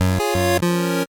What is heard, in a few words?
8bit se